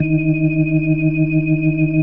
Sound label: EB3F, B3, Vintage Keyboards